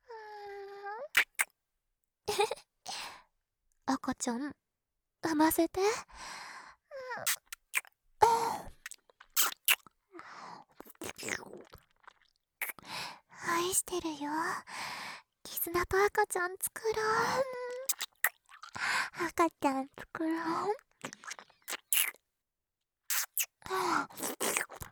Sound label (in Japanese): キス, ASMR; オナサポパート